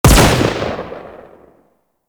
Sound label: Heavy Pistol Shot B; Warframe; Weapons; Zariman Heavy Pistol